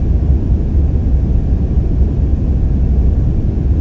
crew quarters; trek

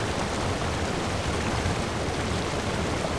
waterfall

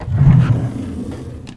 drawer open

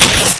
tear

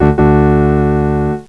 start

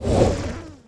fizzle

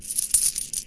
acquire money

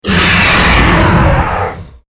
dragon roar

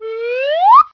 slide

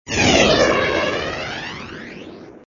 beam

